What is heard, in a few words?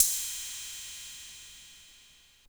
Hats Electro Open